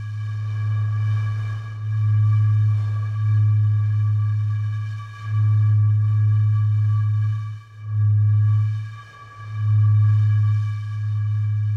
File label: Whistle Stone Champagne G sharp Loop; Journey